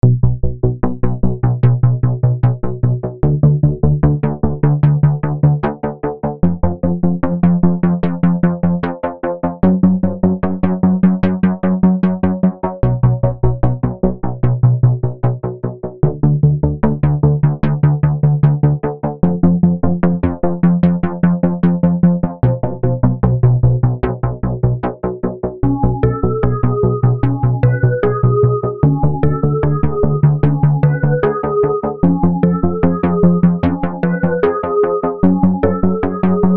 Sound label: e piano startup